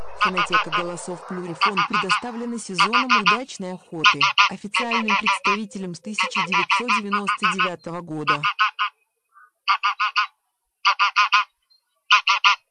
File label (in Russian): Гусь серый